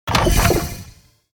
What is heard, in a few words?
Chest open fail reward